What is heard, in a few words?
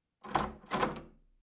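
door handle sound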